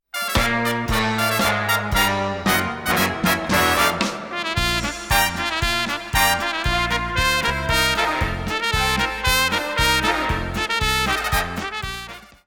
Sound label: (Polka)